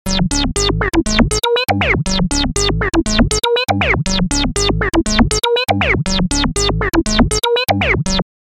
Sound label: acid robot; audioblast